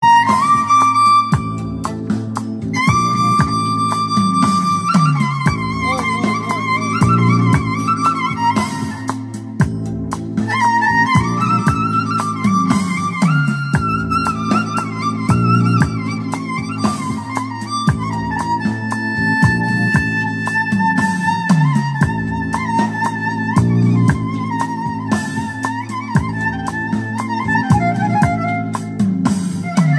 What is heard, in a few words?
improvisation